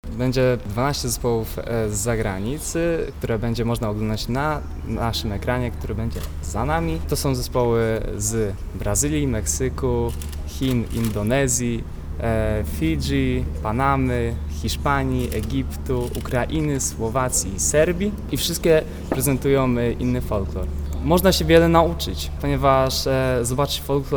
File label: Folk